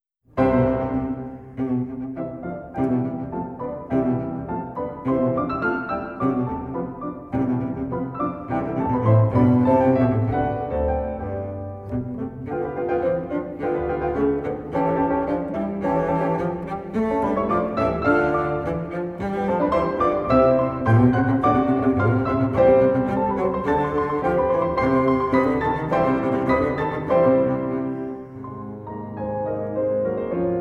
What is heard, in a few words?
Sonata in B-flat Allegro guisto